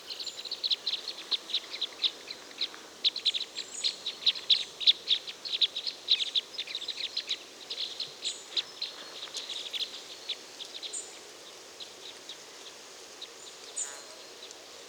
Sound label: Red Crossbill Loxia curvirostra